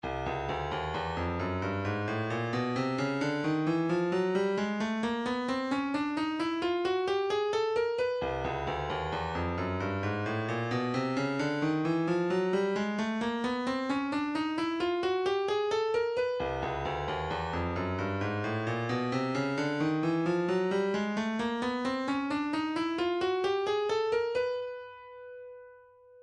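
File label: third percussion 3 4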